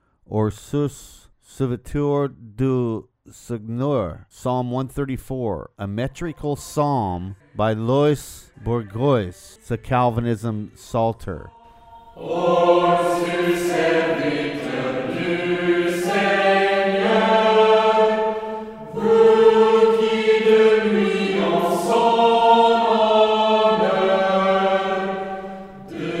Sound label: Psalm 134, metrical psalm; Loys Bourgeois; Or sus serviteurs du Seigneur